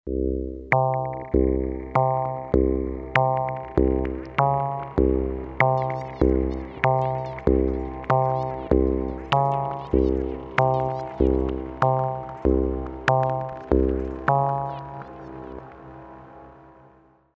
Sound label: Hydrasynth sparse chaos